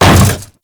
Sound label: Hammer Boss Step Default, Footsteps; Warframe; Grineer Hammer Boss